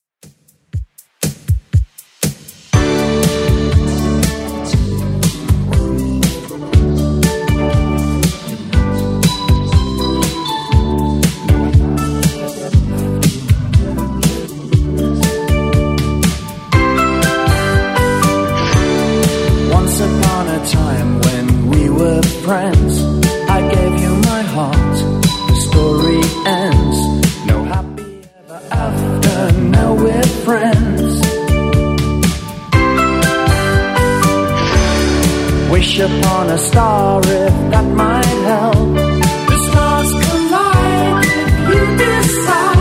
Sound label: 80s Redrum 120bpm